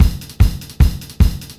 BEAT, Roots Disco; USB Soundscan vol.32; AKAI

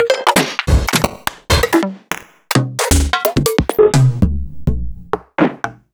Percussions; Sliced

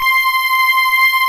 BELLS, JV 1080; Pro-Rec - Synthsonic

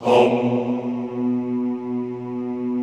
HOMMM B1, Extended Classical Choir; Best Service

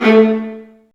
STR, strings; Roland - LA Composer vol. 1

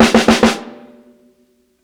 60'S STYLE 100 FILL, DRUMS LOOPS; BASEMENT DRUMS VOL 2

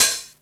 Open hat, retro house samples, Drum Hits

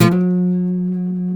G, Martin, guitars; Spectrasonics; Hans Zimmer Guitars VOL-1; MARTIN HAMMR

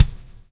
lash, backbeat

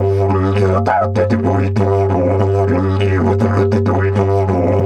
G 100, DIDGE 100BPM, Didgeridoo

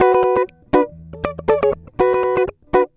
120 FUNKY, Acoustic Guitar Loops; Electric & Acoustic Guitar Loops; USB Soundscan vol.04; AKAI

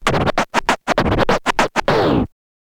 scratch kit, vinyl

